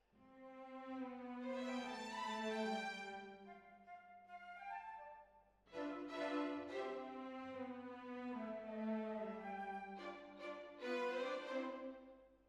Hall, Jazz for piano

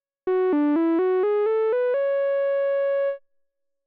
MOOG

